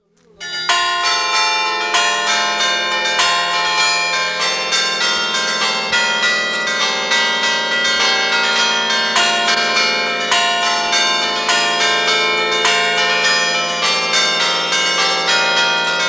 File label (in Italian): campane; Giuseppe Signori di Albino (BG), nato nel 1966, marcia per otto campane; Grumello de' Zanchi, 9 - 8 - 1988. Registrazione di Valter Biella